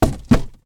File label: Big Cardboard Box Impact; Mechanical Wave; Cardboard and Paper